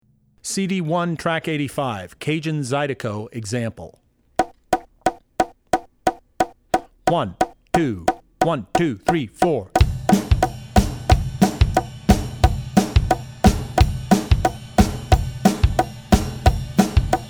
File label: Cajun Zydeco; Drummer's Bible Tracks